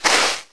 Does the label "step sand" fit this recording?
Yes